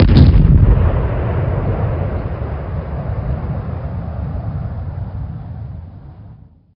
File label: sonic bang far